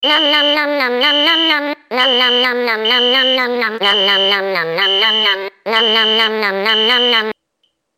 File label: Nom Nom Sms